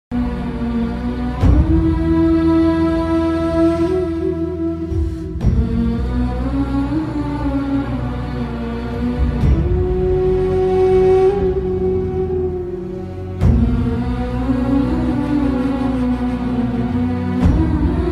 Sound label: instrumental ringtone; pawankhind; chhatrapati shivaji maharaj